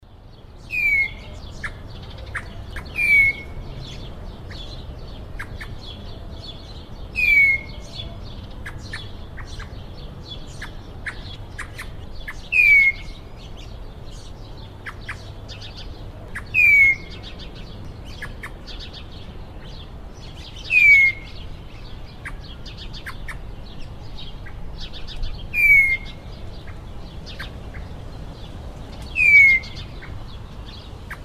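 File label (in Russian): ryjespinnyi drozd, russet-backed thrush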